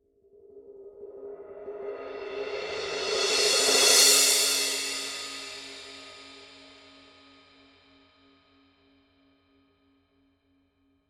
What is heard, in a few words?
signature dark energy 16 dark energy crash mark i roll